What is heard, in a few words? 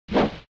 Sword Attack